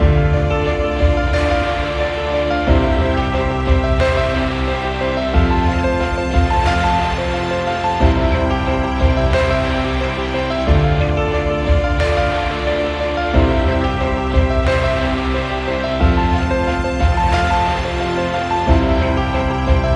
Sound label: dramatic guitar; by burak sound bank; dramatic dram vol 18